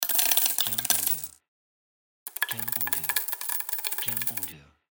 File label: Drop Coins